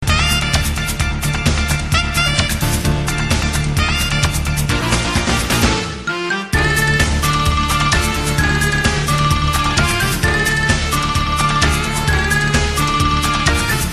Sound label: Mysterious; Conan